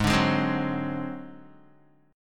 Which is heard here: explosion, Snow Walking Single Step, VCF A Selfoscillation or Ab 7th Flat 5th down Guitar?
Ab 7th Flat 5th down Guitar